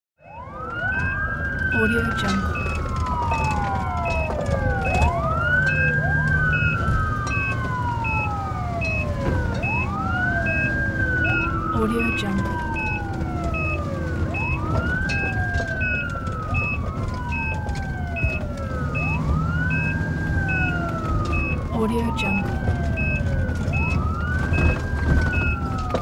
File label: ambulance interior ambience; آژیر از داخل آمبولانس; urban sounds